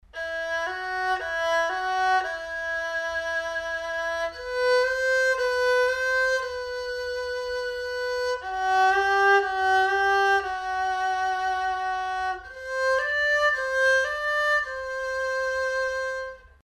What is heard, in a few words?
erhu